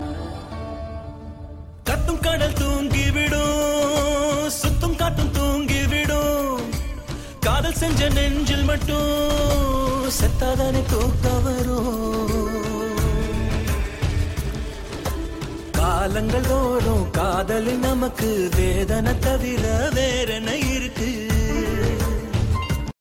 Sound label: Sad Song